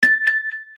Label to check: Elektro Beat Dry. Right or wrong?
Wrong